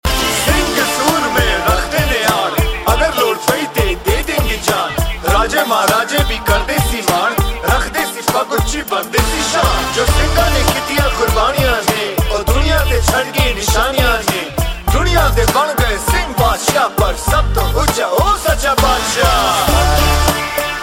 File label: Punjabi Rap